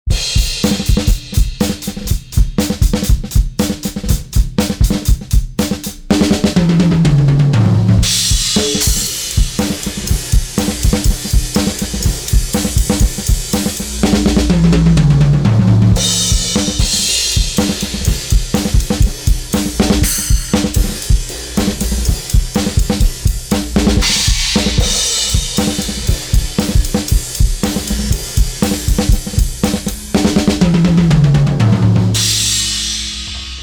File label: Drums; Kelvin